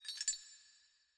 ambience room chain base

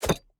object grab bottle

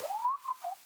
ambience room butchery whistle

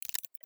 ui time control

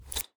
ui submenu units close

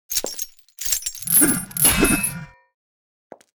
demoness strike

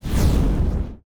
spell fire throw; player

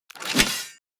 lance skeleton strike

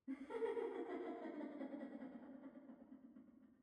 ambience room witch lodge laugh

